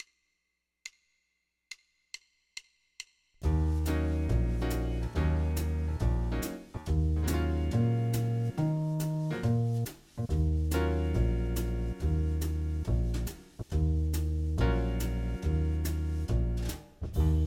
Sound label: Ebm7 1 Chord Groove; guitar; guitar lessons